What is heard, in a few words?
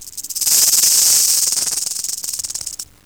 Rattler, eastern washington snakes